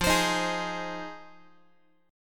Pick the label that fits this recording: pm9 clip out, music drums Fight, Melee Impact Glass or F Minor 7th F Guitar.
F Minor 7th F Guitar